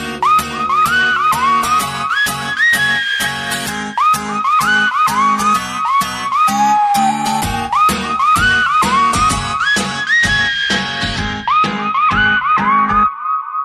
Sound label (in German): Gitarre; Kill Bill